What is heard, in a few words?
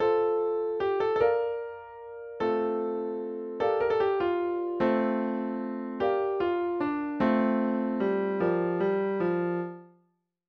instrumental